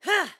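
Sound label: Jump Attack, voice; Link; BOTW; Breath of the Wild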